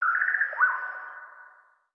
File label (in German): Tropenvogel